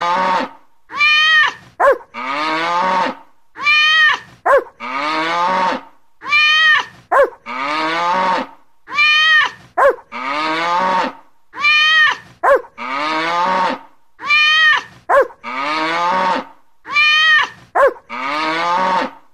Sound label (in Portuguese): Gato Cachorro Vaca